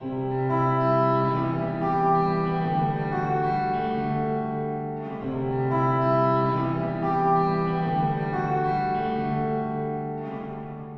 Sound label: reverb plate; guia de pedales de reverb